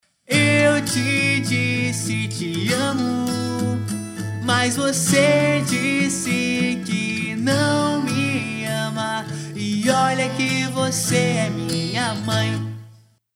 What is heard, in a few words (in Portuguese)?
musica emo; menor musica emo do mundo